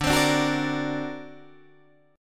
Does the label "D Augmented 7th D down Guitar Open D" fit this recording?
Yes